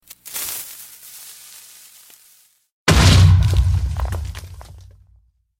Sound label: explotion